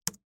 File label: key press; hyperframes